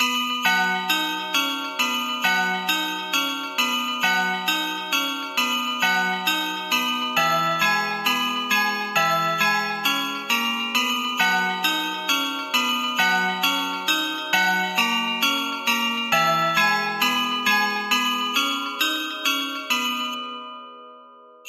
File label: Rock n Roll Christmas Loop Bells and Jingle Bells Only